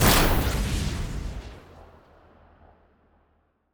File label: weapon missile; weapons; starruler